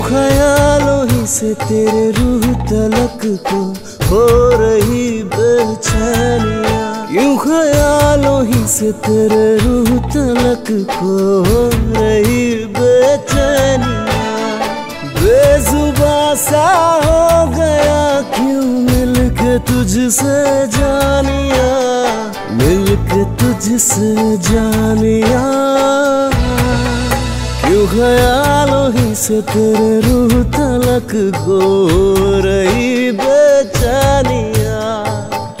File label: Romantic Valentine Day Ringtone